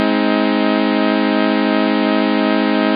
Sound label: triad JI vib; third